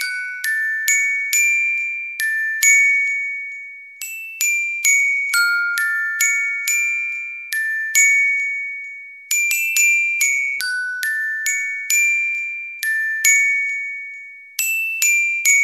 Creepy Baby Toys

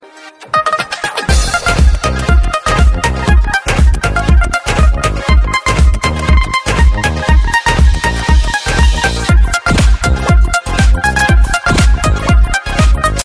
punjabi song

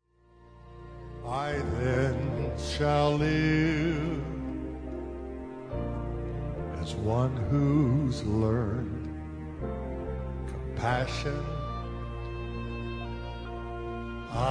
vocal band

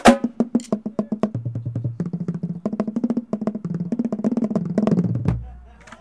Bass Accel SMS

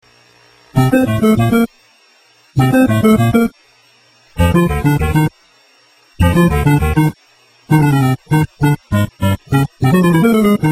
video game tone; games; ringtones

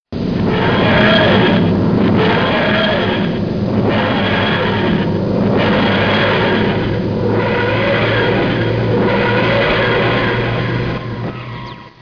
whoosh; tardis

